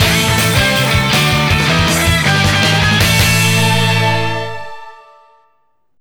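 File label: jingle win; VF5FS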